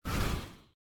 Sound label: whoosh out; perdachcraft; minecraft